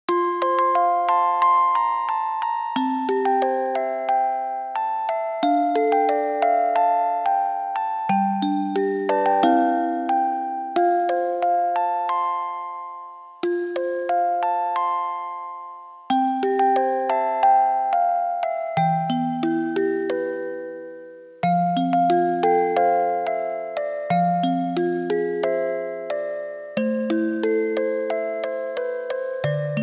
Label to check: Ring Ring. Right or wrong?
Wrong